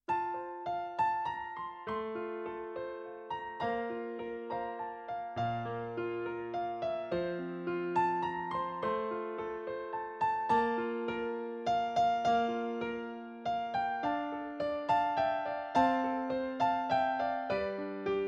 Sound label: piano